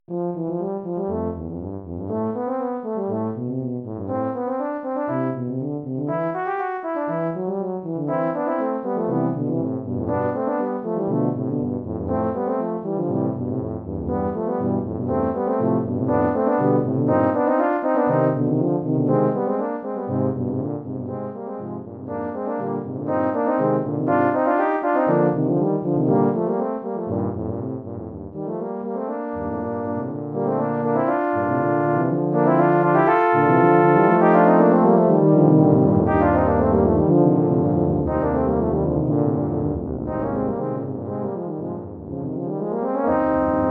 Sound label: Tuba Suite Caccia